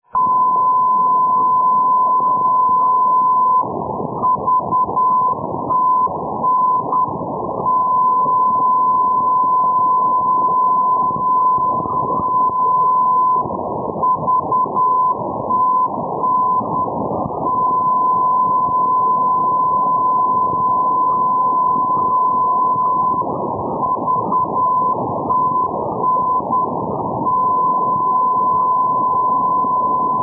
ndb kraljevo - vitanovac; europe

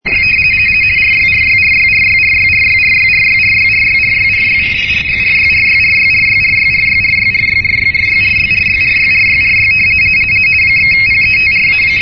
amman rtty 50 bd; petra amman

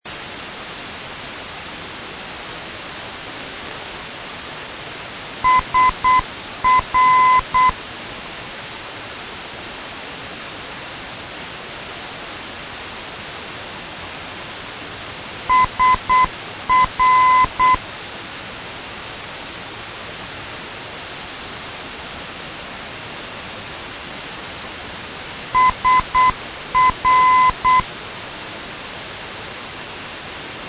ndb tashkent sergeli; uzb

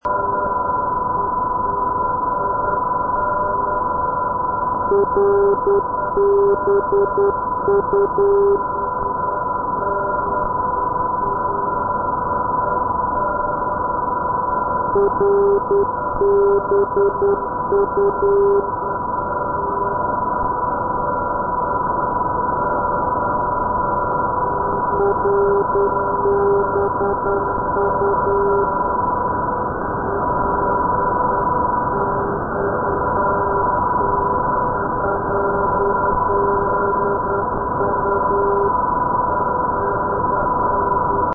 ndb orebro; julussdalen-kiwi

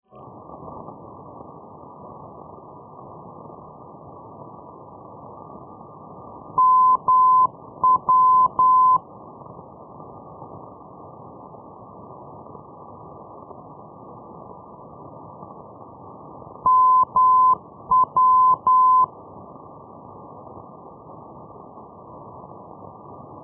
ndb berlin-blankenfelde mw; europe

